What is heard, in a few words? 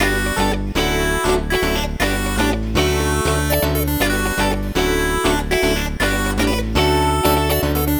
Backing, 120bpm D, Nu Disco; Zero-G; Happy People